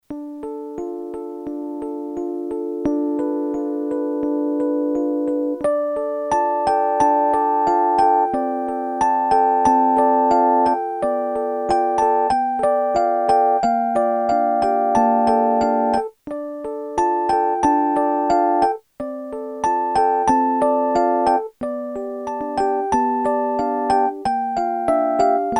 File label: Delay; t.c. electronics M300